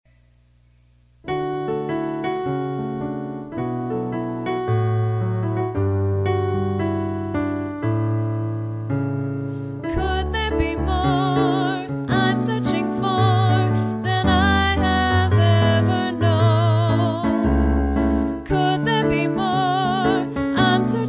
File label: vocal; PSE Music